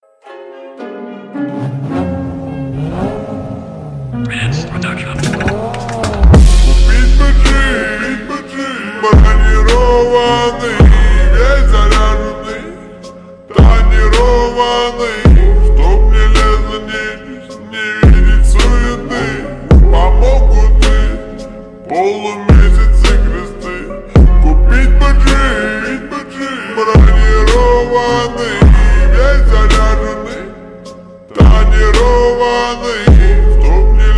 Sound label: slowed reverb